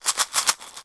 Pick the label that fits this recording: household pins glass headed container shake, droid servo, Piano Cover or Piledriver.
household pins glass headed container shake